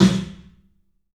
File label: CLUB SNARE; 3D Sound; 3D Drums